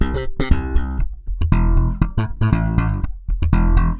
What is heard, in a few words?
SM BS C, BASS SAMBA